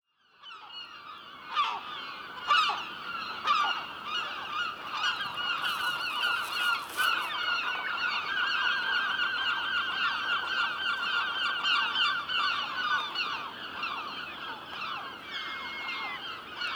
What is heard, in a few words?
Gulls; ambisonic; soundmanjohn